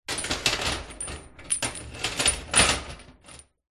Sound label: industrial chain large movement scrape hoist over metal light room reverb; zapsplat